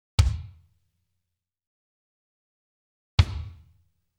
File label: bass drum dry; Sound Sampling Collection VOL-5; Drums & Bass Fusion Funk Effect